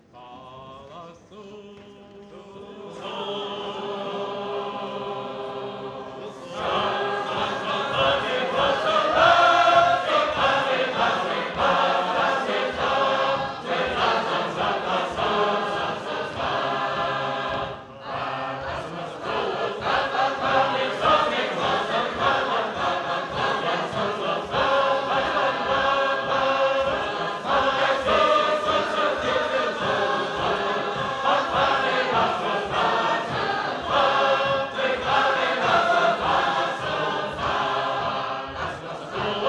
Bear Creek, sacred harp

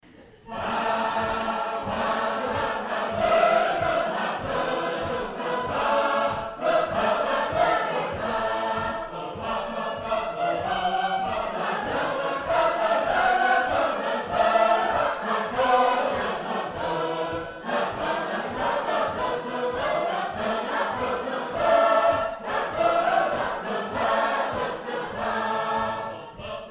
Providence, sacred harp

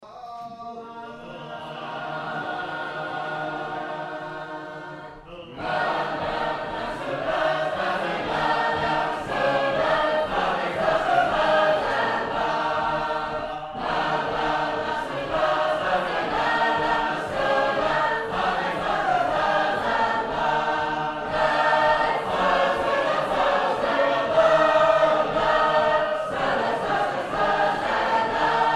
Sacred Harp; The Golden Harp

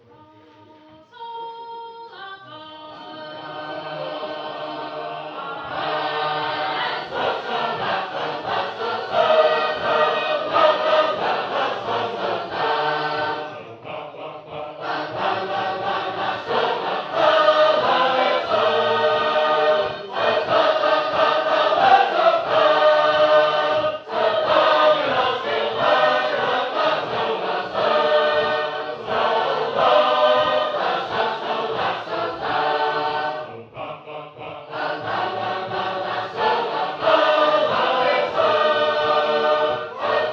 Cheves, sacred harp

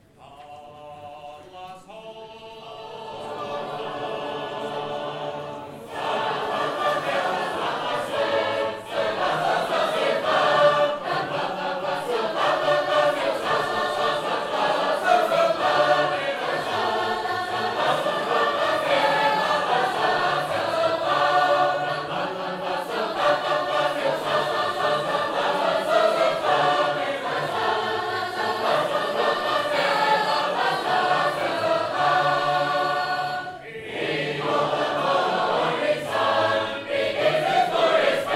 Sacred Harp; Sounding Joy

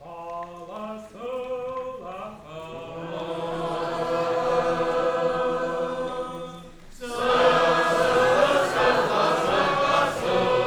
Buck Street, sacred harp

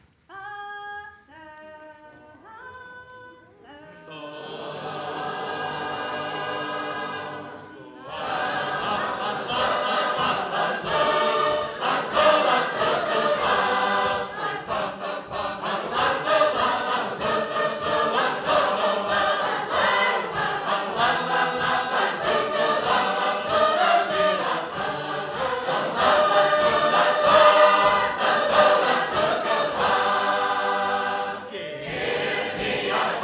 A Thankful Heart, sacred harp

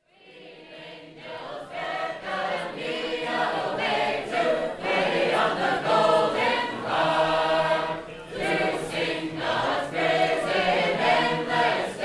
Sacred Harp; The Golden Harp

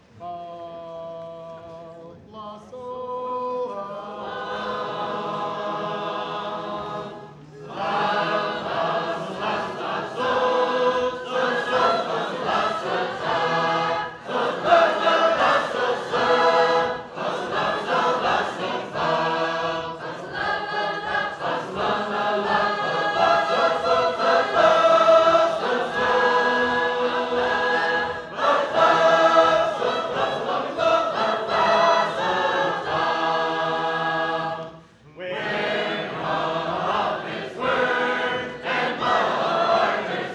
Harpeth Valley, sacred harp

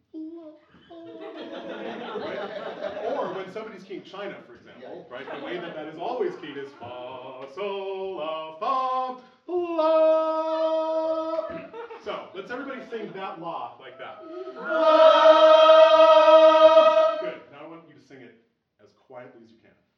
talking keying; China

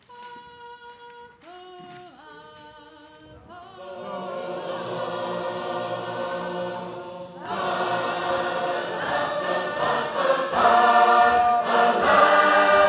Newburgh, sacred harp

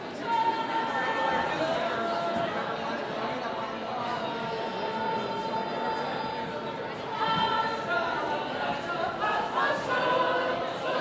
Sacred Harp; Northfield

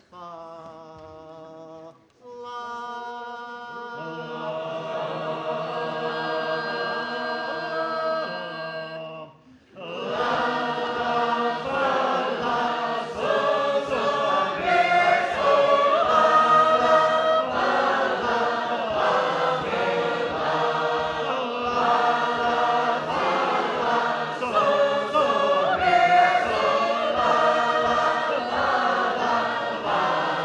Shouting Song

